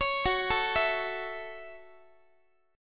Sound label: Gb Minor 7th Gb down Guitar; arpeggiated; JGuitar